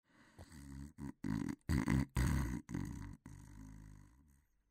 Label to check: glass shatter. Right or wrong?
Wrong